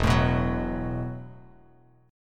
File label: E Suspended 2nd Suspended 4th E, Bass Standard